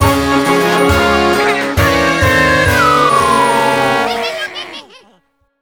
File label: Item Get Fail; Baboon